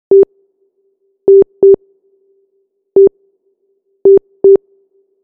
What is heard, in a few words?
button, oven; prime oven